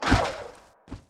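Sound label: dark archer damage; monster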